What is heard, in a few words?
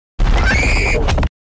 scream